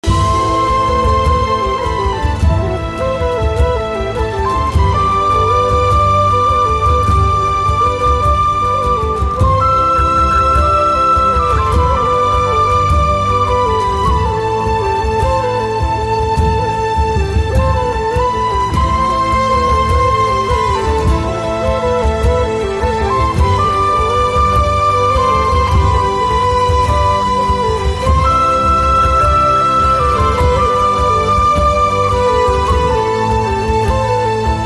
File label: Spiritual ringtone